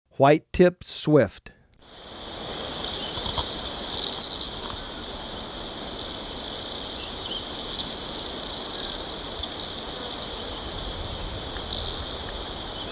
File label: White-Tipped Swift, Birds of Ecuador; S. America